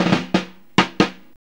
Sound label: FILL 96, Funky Elements LOOPS; Zero-G